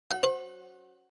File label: incorrect